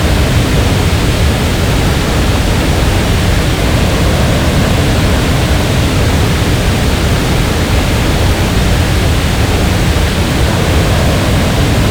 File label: Aircrafts Engines Mig Back Engine; DCS